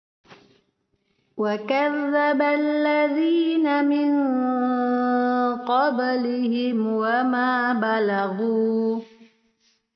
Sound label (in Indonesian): Perekaman baru Karaoke denoised